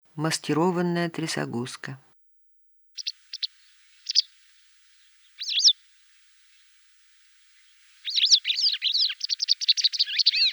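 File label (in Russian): maskirovannaya tryasoguzka golos, masked wagtail voice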